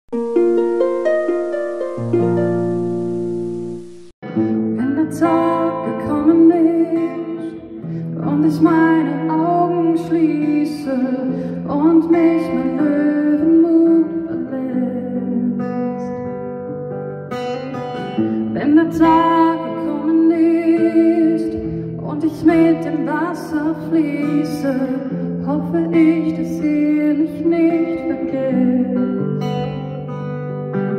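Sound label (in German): Sängerin Beerdigung Live Gesang Soundcheck Kirche; Aargau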